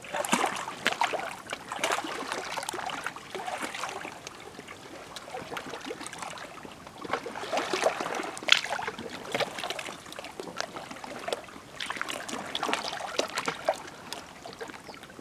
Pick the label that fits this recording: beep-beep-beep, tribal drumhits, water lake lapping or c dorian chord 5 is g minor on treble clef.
water lake lapping